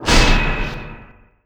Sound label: fail; SPELLS